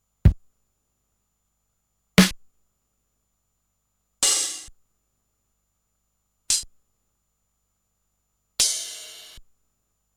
Wersi CX2 dry Instruments

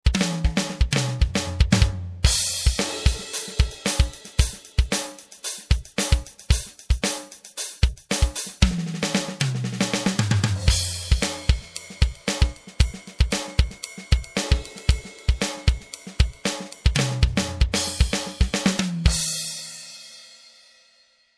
Fusion Set

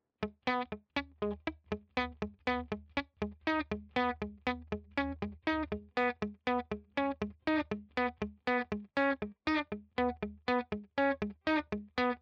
Riff clean

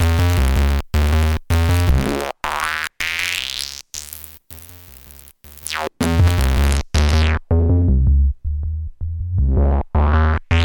DJ Filter; PlasmaVoice

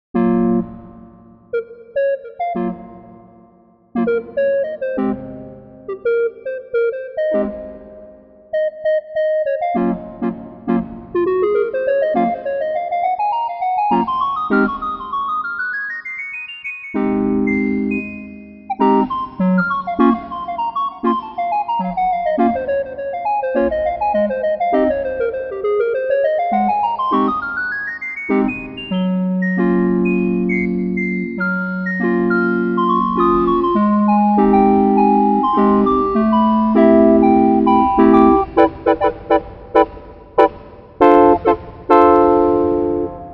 Reverb

